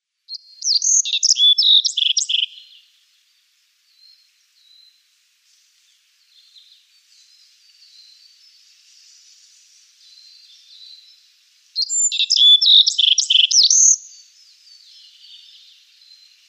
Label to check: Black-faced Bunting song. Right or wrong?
Right